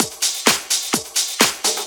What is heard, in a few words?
Latin Loop 128 BPM